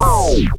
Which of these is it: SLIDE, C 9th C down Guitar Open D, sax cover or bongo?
SLIDE